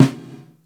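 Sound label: EQ PICCOLO SNARE; InVision Interactive; Lightware VOL-1; Instruments & Percussions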